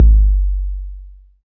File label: BD SYN; Sound & Vision - Gigapack II CD 2